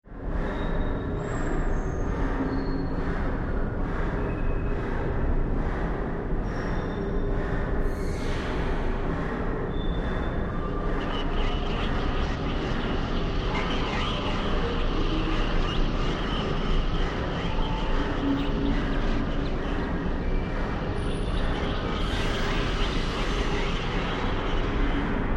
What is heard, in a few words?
Factory Horror Industrial Madness Ominous Scary, Factory Industrial Ominous Scary Metallic Dark; Scary Soundscapes (Sound Effects:Horror)